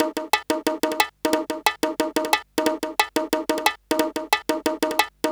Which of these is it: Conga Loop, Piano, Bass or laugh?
Conga Loop